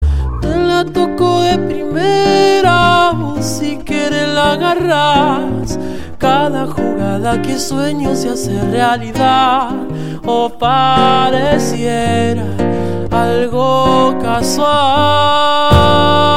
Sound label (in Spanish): acustico